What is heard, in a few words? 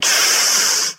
sniff